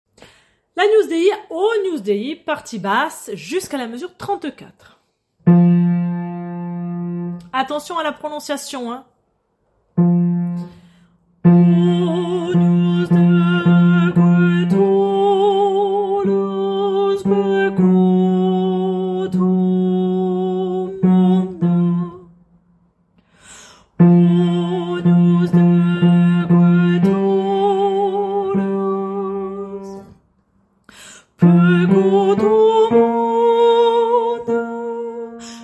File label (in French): Agnus dei Basse